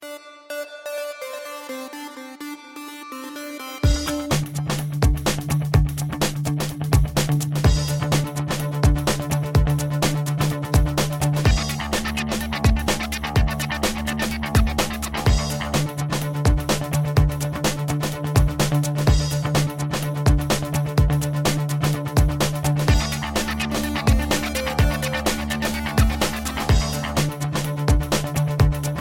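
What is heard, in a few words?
Guitar Tone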